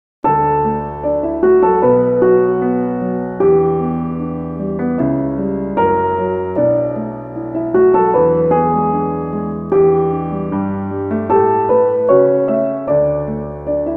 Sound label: Piano Theme